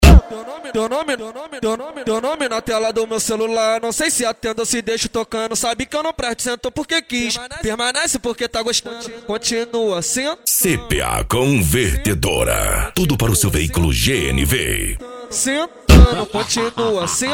funk ritmado; cuiaba mt

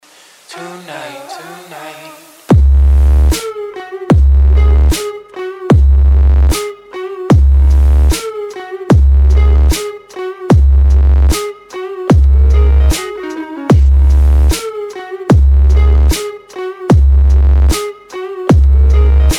sound bass automotiva; uba mg

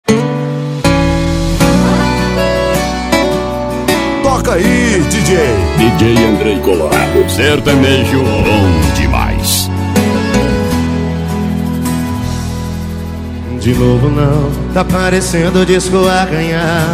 sertanejo com locutores de rodeio

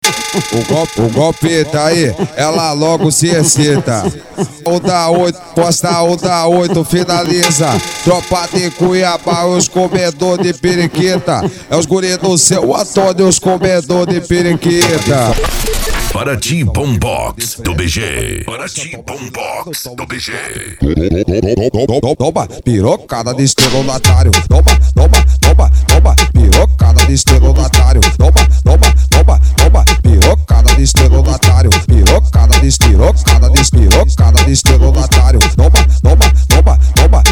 bombox funk

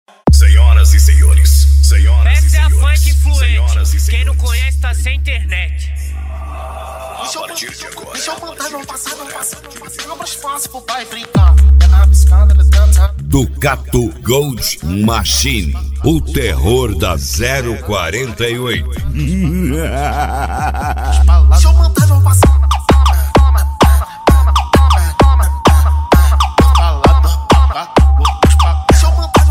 especial funk

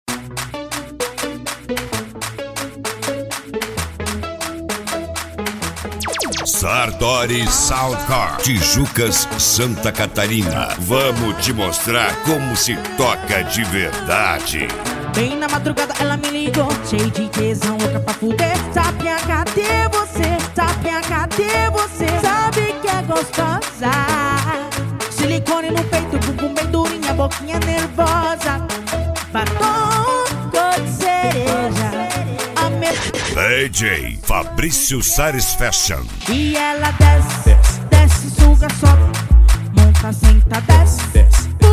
sound car; reboque poseidon sartori

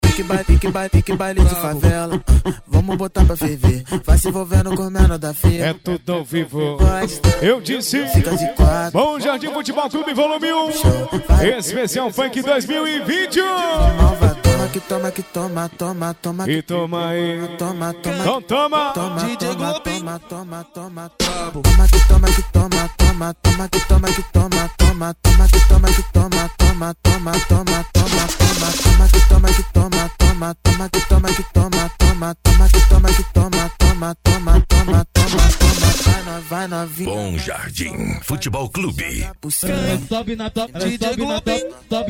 funk pisadinha sertanejo

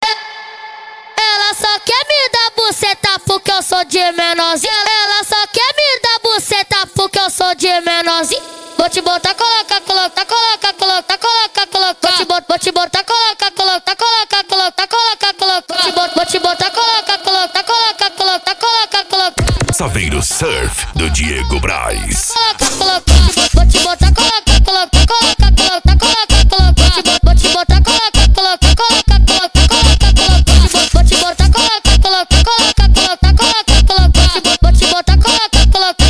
funk consciente e funk ritmado

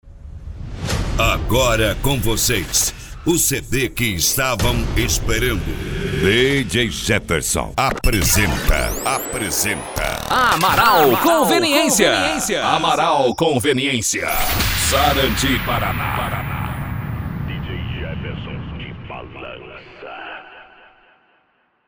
funk e sertanejo; amaral conveniencia sarandi pr

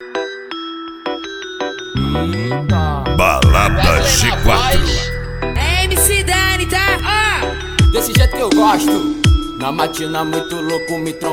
brega funk; putaria 2020

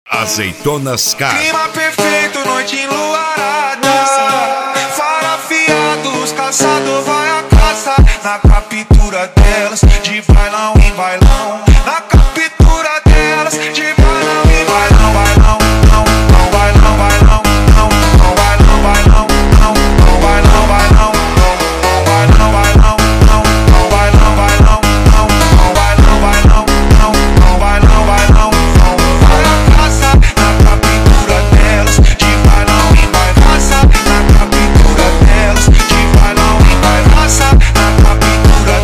esp insane sound; azeitonas car; barretos

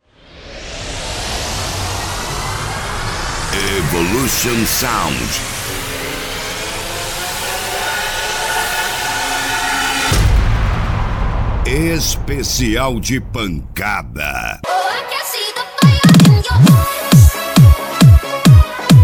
pancada

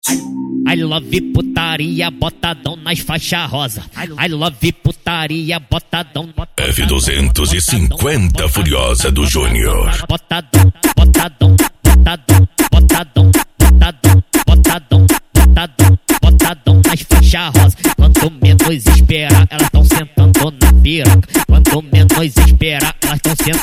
funk e sertanejo

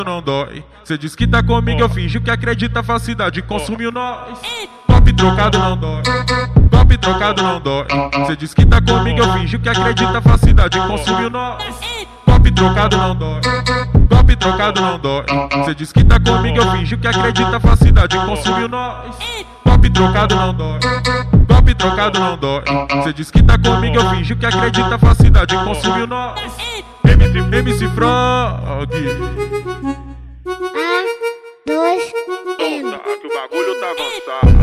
cd retro funk; pista antiga; funk antigo

